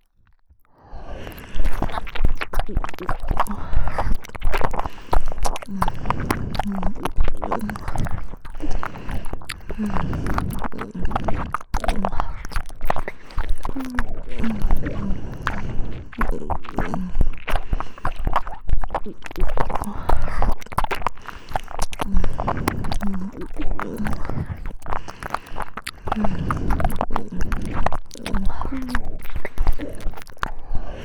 耳舐め; 左リディア右リディア

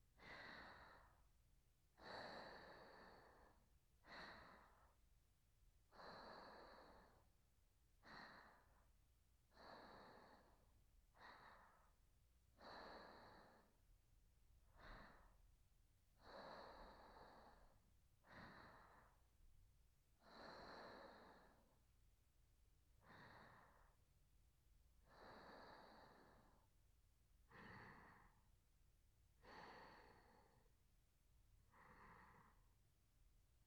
寝息; SEなし

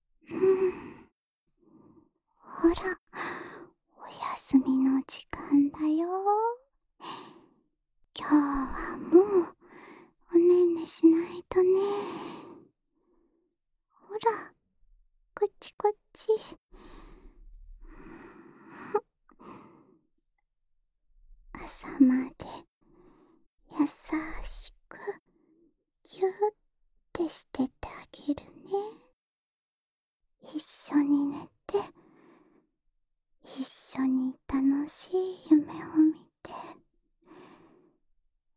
安眠用密着添い寝（左耳）

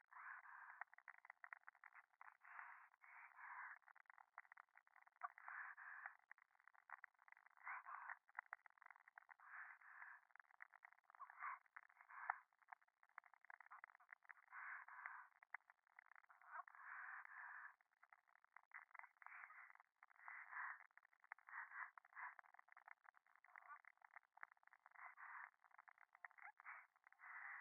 電話 左耳密着処女喘ぎ 暖炉

